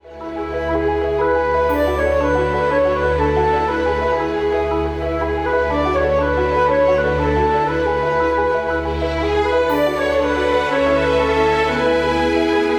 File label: Children Theatre